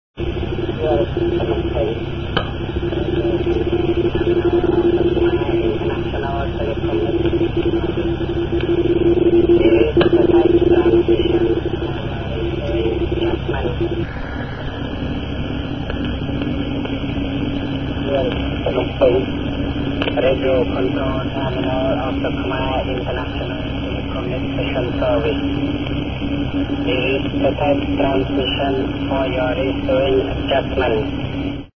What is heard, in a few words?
usb